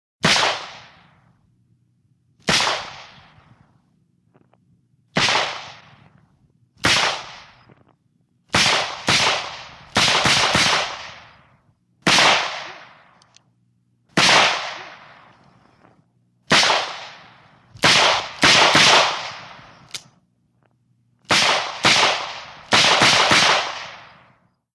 Pistol Rapid Fire Lng, pistol exterior

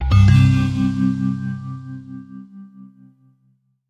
Bell Logo, stinger

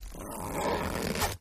zipper; zippers

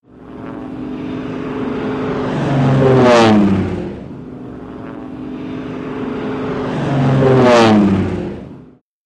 Plane Japanese Zero, bys

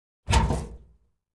Cabinet Door Open

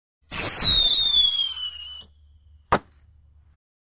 Bottle Rocket, fireworks

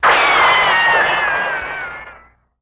Ricochet, bullet bys & riccos

